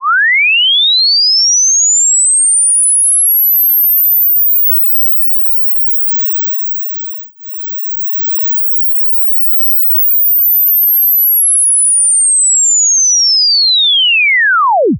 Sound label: LinSweep decimated; Aliasing